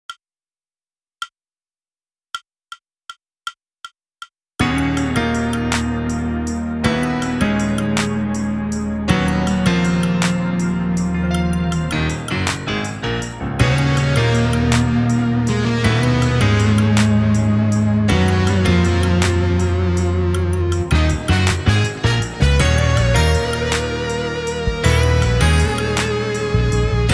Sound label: drumless